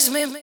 Fx Vox; Equipped Music; Loungin House

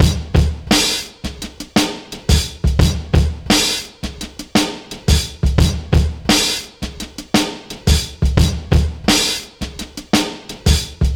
Drums, Cinematic Funk; Sonic Foundry (Sony Creative Software); Cinematic Funk Urban Tone Poems

